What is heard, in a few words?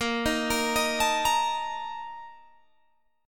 A sharp Major 7th Suspended 4th, Guitar Open G